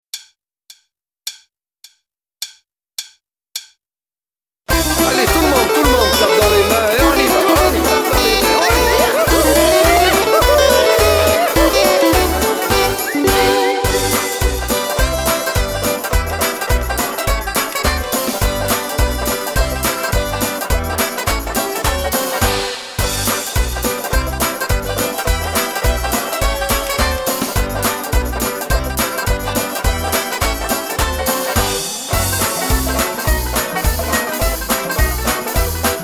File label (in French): play back ambiance